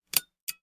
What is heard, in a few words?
Lamp Switch Pulling; switches